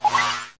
jump

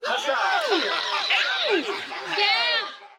cheer

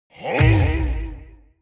hork

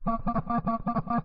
slow blrb

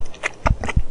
nom nom

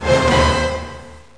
dramatic stop

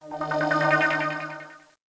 teleport

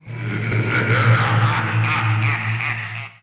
zombie cackle; Various Evil Sounds

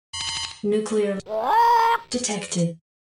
nuclear parrot

